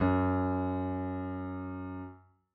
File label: piano f#; Golden Crayon Sounds